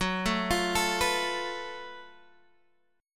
Gb Minor Major 7th Double Flat 5th, Guitar, Half Step Down, chord